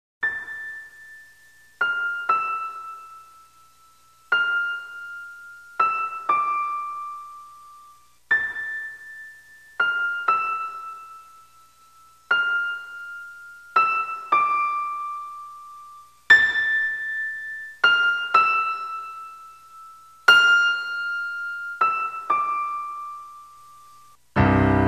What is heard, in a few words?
intro instrumental